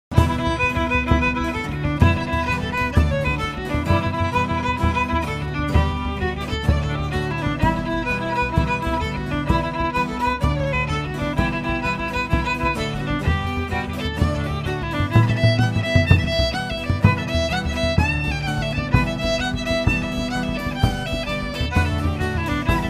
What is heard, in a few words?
Jig; Morrisons Jig